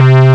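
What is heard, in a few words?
square; sound canvas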